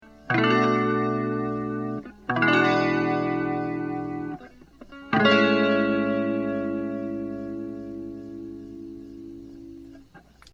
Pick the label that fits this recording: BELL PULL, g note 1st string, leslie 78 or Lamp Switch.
leslie 78